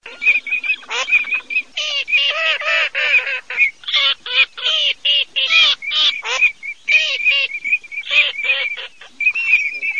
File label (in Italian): alzavola, birdsound